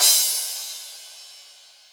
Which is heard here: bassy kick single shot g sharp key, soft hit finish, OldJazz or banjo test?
soft hit finish